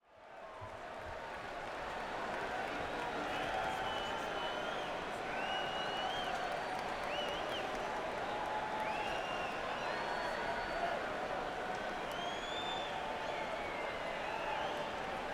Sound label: Crowd, Madison Square Garden; New York, NY